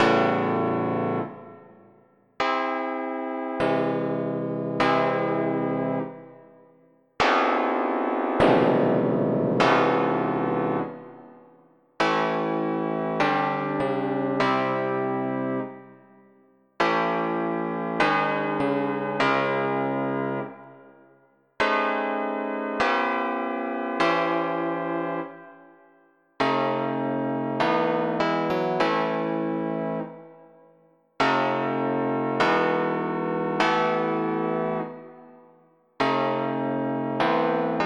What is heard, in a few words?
chords